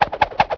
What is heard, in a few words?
Boomerang; Crash1